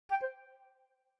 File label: notify